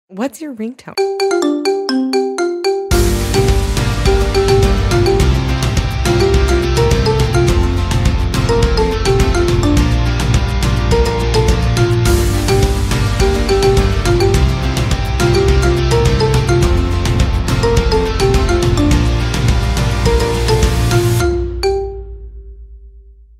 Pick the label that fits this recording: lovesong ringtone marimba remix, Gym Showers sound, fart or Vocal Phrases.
lovesong ringtone marimba remix